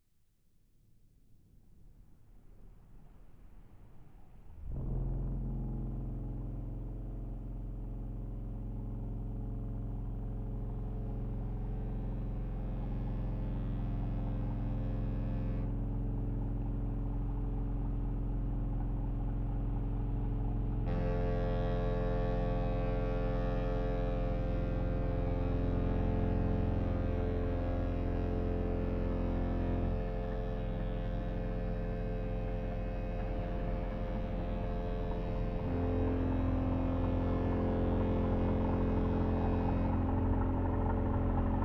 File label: SIRENEs; 2008 wksp marseille; calanque promenade